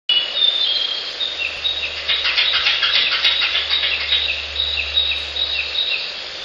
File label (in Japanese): タイワンリス